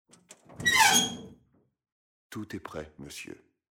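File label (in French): door announce soldier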